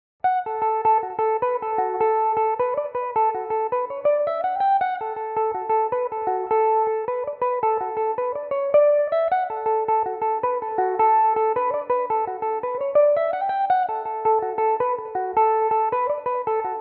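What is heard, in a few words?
D major, Irish mandolin; Old Joe's; Belmuse EM-190 electric mandolin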